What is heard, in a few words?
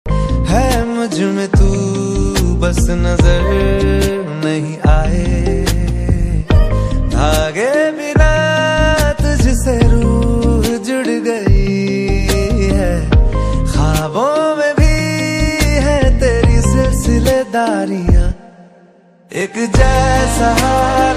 hindi ringtone